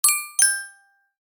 Game fail sound effect

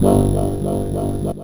wobbling shield

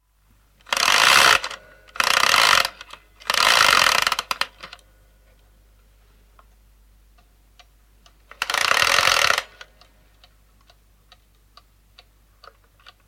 clocks; bbc

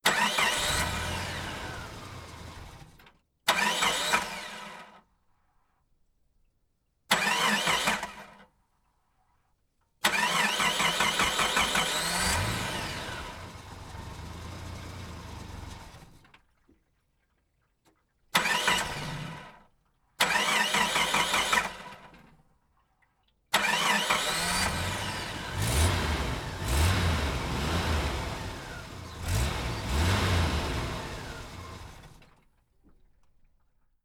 car engine startup sound

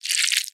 animals insects worm movement; zapsplat

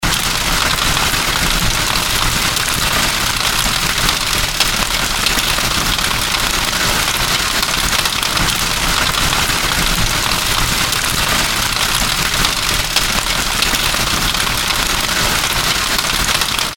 Hailstorm; Mike Koenig

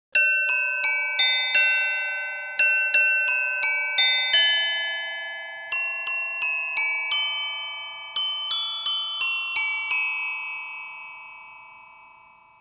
Christmas jingle bells intro melody

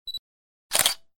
camera shutter sound

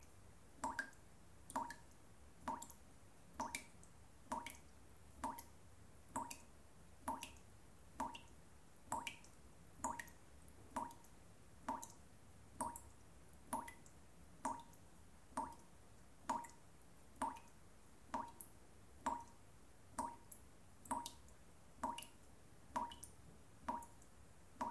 Dripping Tap Sound Effect

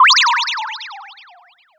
magic drop

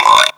frog, funny frog ringtone

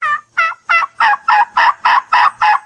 Turkey Call

